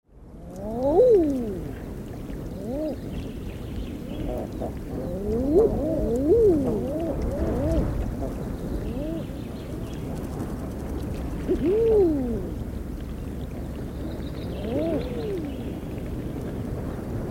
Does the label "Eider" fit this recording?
Yes